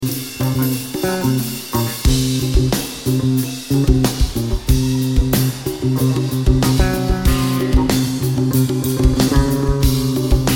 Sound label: Drums and Bass